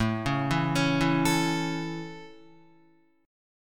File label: A Major, Guitar; arpeggiated